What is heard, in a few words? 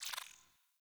Dialog Close; UI; Default Theme; Warframe